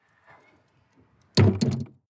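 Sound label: sports car exterior trunk close; verkehr-transport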